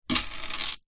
household necklace beaded pick up from wooden table